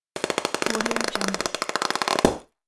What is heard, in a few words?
squeezed plastic pressure pop